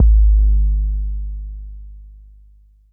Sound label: BD 808 Noise